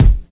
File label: 00s good hip hop bass drum sample f key